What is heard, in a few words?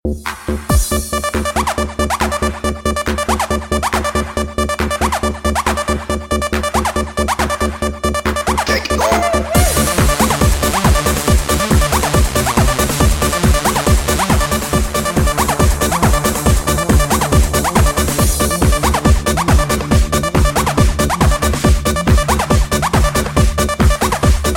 Techno Lovly Romanti